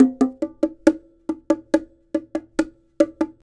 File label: Bongo 70 bpm